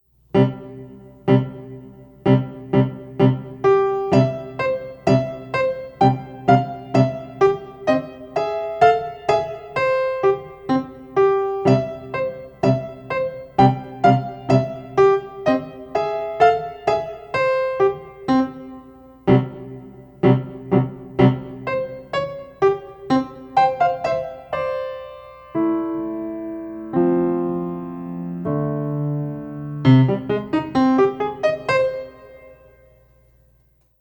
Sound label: Little Soldiers March piano; Piano instrumental